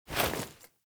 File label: Female Foley Action Default Melee Heavy Swipe, Default Clothing; Characters; Player